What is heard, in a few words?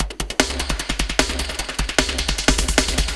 fractal blender groove, hyperspace pulse grooves